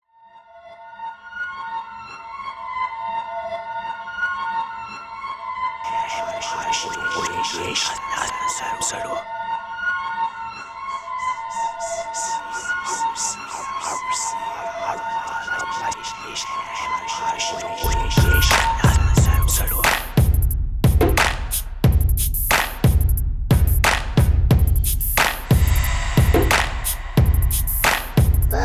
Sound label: BEATS